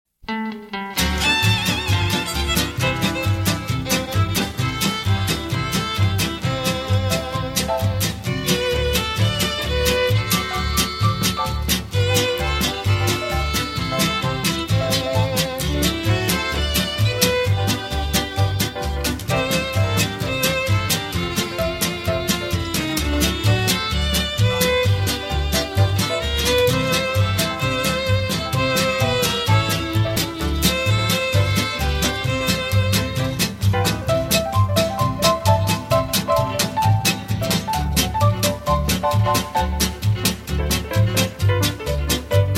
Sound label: music sample